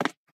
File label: Peg Leg Footstep